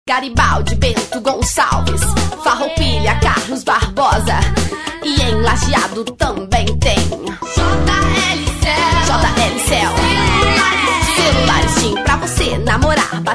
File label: Jingle